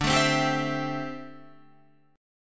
D 7th Suspended 2nd Suspended 4th, Guitar, D7sus2sus4 chord; D7sus2sus4 strummed